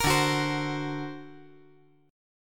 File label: D Augmented 7th D down